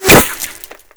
impact masonry; weapons; Modern Warfare

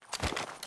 m4 reload lift, foley

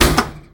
vending machine button press; ModernWarfare

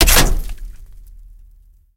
bullet hit outside; War Thunder

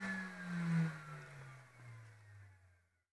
Aircrafts L-39 PT-500 end; DCS

